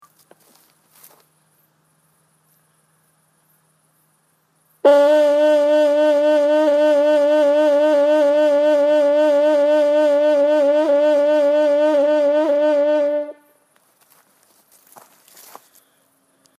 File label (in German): Vibrato durch Bewegung des Unterkiefers